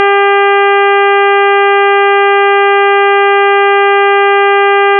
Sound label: wave static, 400Hz＋8倍音のベース音（尖ったサイン波のように聞こえる）